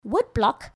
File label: woodblock